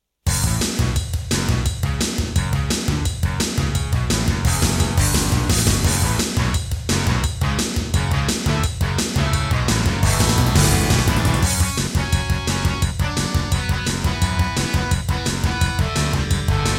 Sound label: Dreamblaster X2GS; Serdaco; Duke Nukem 3D